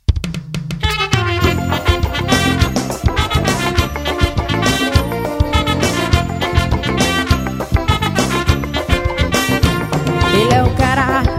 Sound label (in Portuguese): forro